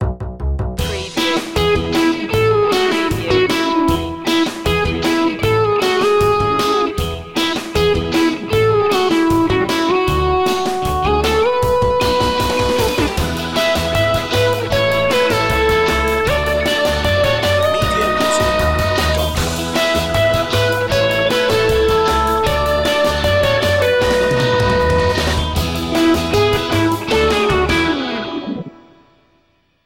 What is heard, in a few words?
roller pop